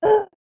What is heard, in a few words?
weird, creature